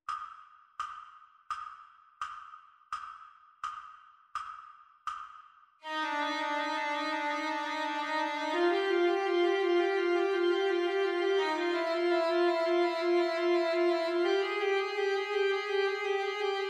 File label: Backing Track; Study No. 31; Grunwald; ViolinSchool